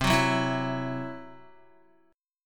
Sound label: C Major Flat 5th C down Guitar; strummed; CMb5